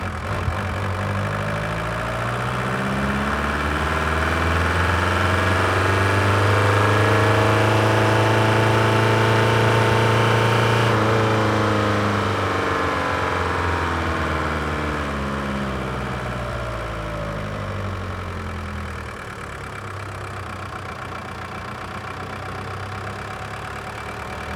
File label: Volkswagen Golf II 1.6 Diesel Engine Steady RPM Increase Decrease; Mono; SoundHolder